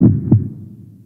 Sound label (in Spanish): corazon latido